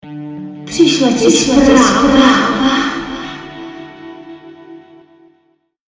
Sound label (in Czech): kostel kytara